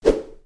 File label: whoosh; hexagon glitch 3